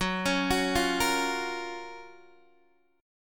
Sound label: F sharp Major 7th, Guitar, DADGAD